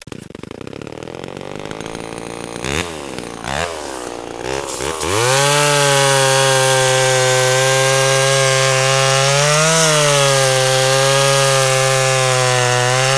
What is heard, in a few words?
CHAINSAW